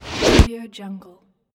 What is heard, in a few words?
ball whoosh